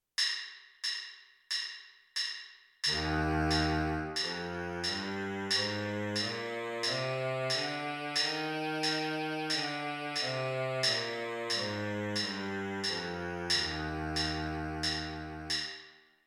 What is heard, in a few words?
c major scale baritone sax lower octave